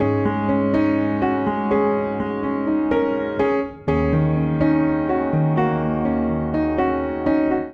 Phrase Suspenseful i-v Ab Minor 124 bpm, Upright Piano; MIDI Clips; Upright Piano by Spitfire Audio; Ableton; Factory Packs